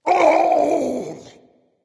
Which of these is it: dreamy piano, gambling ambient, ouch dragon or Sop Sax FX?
ouch dragon